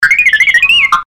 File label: R2D2